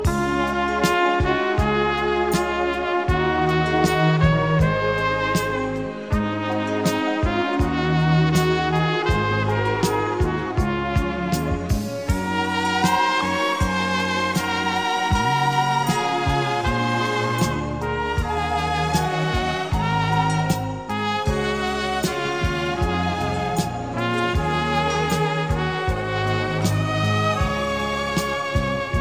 trumpets sounding

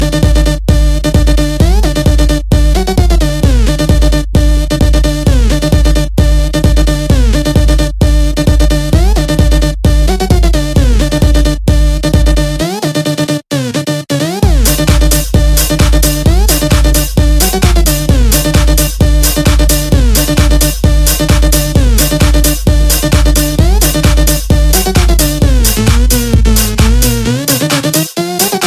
electro, ringtones, electronic

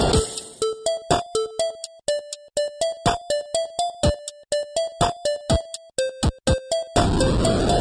8 Bit

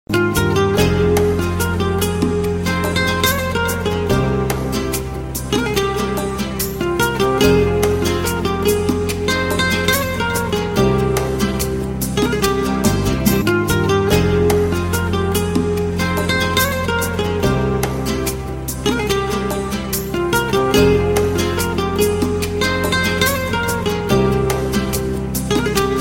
spanish arabic; ringtones; latino

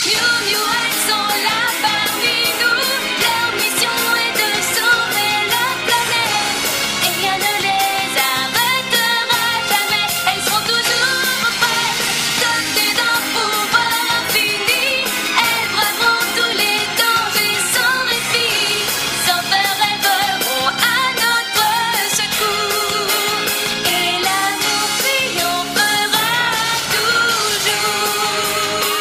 baby laugh

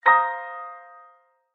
htc notify piano; ringtones

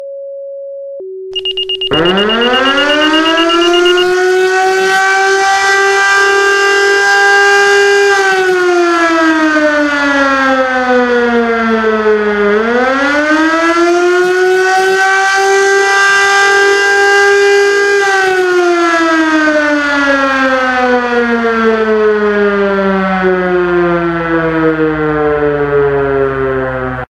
PAGE SIREN

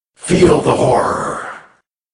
horror; ghost rider

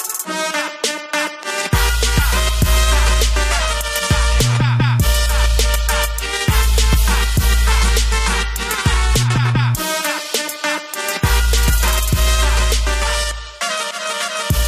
Trap

